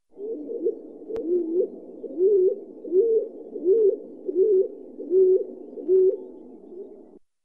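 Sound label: stock dove voice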